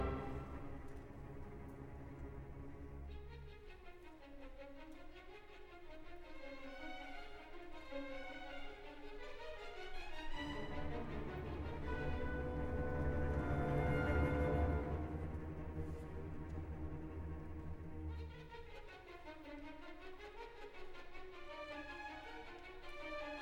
Symphony No. 6 In F Major, Op. 68 'Pastoral'- IV Allegro - Thunderstorm; Beethoven; Solti; Ludwig Van Beethoven